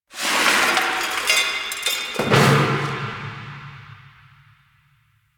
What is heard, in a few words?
Subway Garbage; Ambience; Warframe; Nineteen Ninety Nine